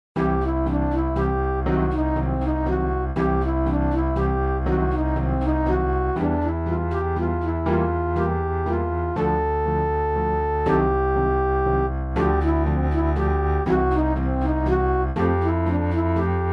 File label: waltz for ballet; クラシック; 穏やか